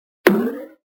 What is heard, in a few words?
Bonk